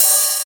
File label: Open Hihat